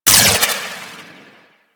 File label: Prism Laser Hit; Warframe; Warframes; Harlequin